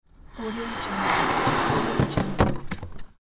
balloon inflate; bestsfx

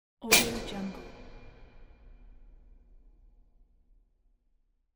stadium breaker switch

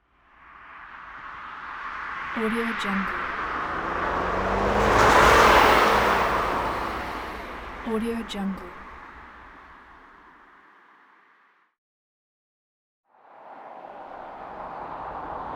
cars on highway

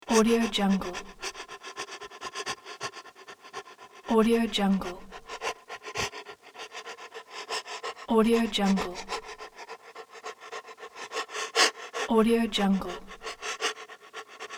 dog panting

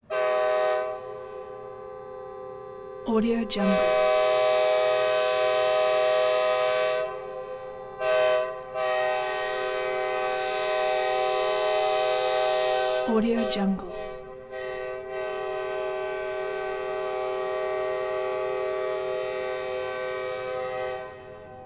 train horn

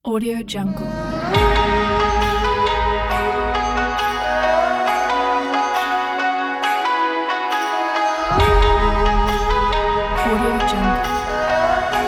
chinese new year

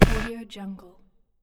gritty scifi impact

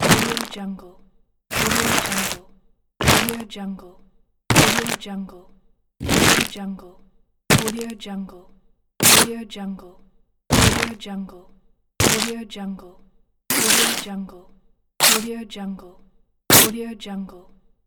crunchy paper sounds

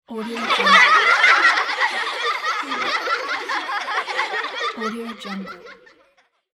kids laughing

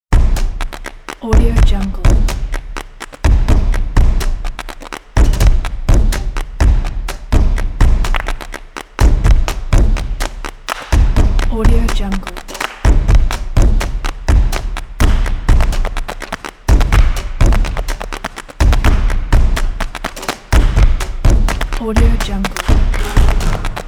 spanish upbeat drums

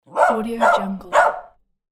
happy dog barkling; progmind

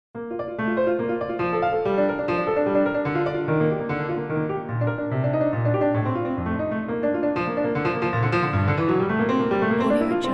bach prelude no 21 piano

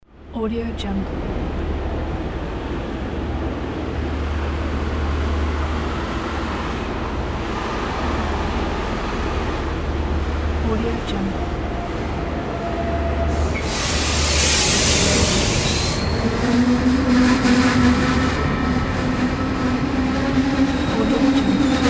train arrival; stefanocremona

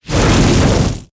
quick dragon fire breath; sound ideas

